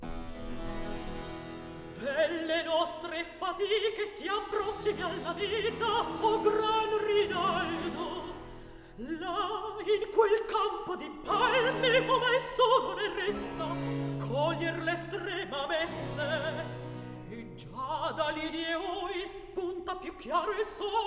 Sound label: Recitativo; Delle nostre fatiche; Handel; Rinaldo; Act 1